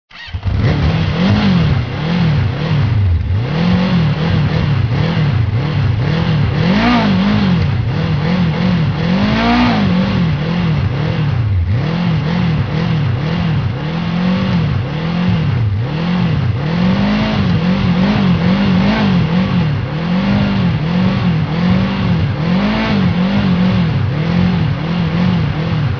motorcycle start stop

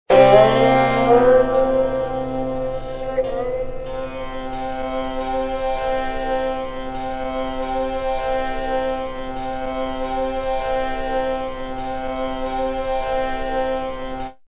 sitars

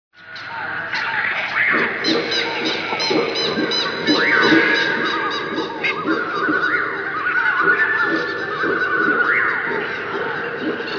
african jungle

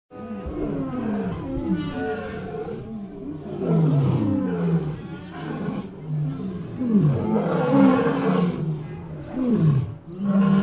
lion pack

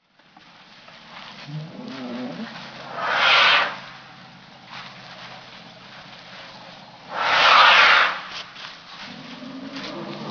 elephant blowing trunk

